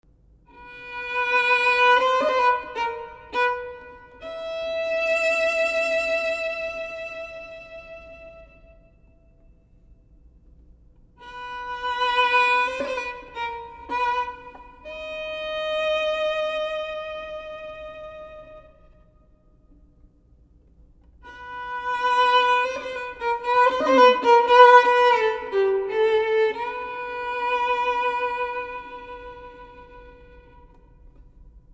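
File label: jewish gypsy violin sad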